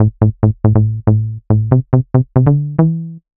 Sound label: ELECTRO BASS 140 A